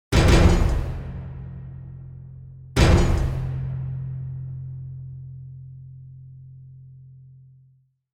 Trailer End

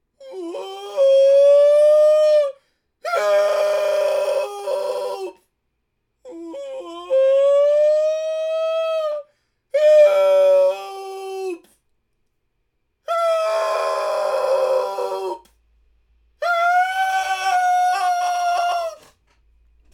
Kid Screaming For Help

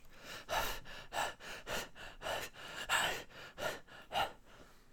Guy Run and breath

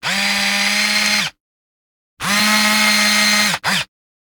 Zoom Lens

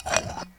Rubbing Glass

